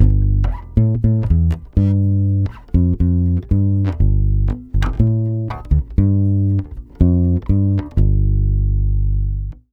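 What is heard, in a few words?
120 BASS G minor 7, Brazil Bossa; USB Soundscan; AKAI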